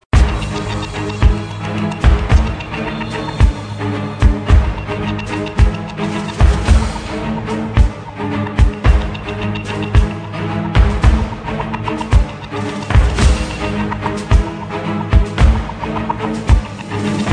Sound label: Music Space Battle Full Loop; Galaxy on Fire 2 HD